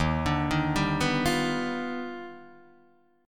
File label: Eb Augmented Major 9th Eb down Guitar Open D